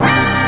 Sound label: Waah; Beat Saber Miss Sounds